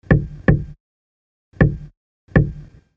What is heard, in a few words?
knock